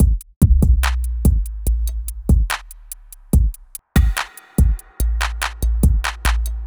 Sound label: r and b